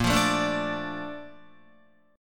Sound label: Bb Major 7th, Bb, down, Guitar; BbM7 strummed; JGuitar